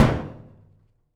Metal

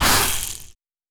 Sand gravel

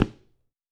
Dress shoe

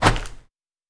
Snow

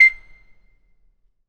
Basketball Court

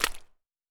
Mud

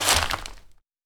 Leaves

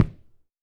Sneaker

